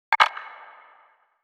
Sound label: Wood Click